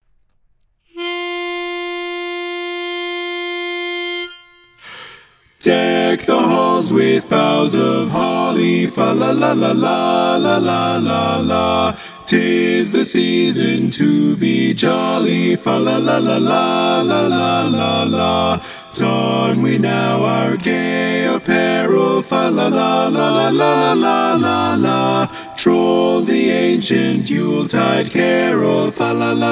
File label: 4 part; Christmas